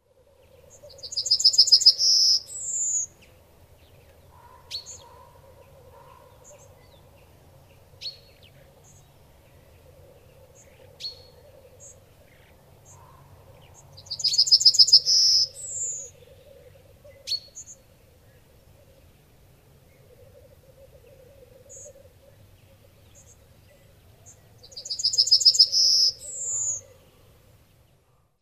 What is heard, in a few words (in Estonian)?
talvike; spring; linnud